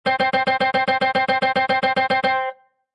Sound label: Casino Electronic Sound Wheels Spinning, Slot Machine; Casino, gambling. Slot Machine: Electronic melodic sound "Wheels Spinning"